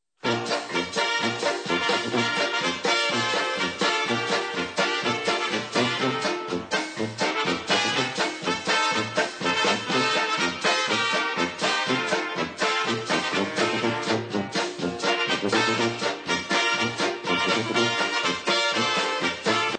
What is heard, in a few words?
TICK TOCK POLKA